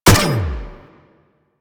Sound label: Energy Rifle Shot; Warframe; Weapons